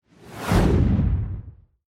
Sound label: Sci Fi Whoosh